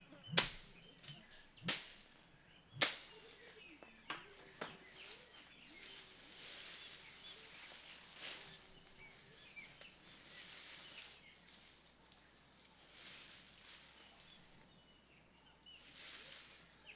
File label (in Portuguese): Batendo com barra para processar feijao ambiente fazenda; ambiencia rural